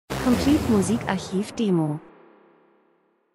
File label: transition; Transition & Movement - dunkel fliessend Akzent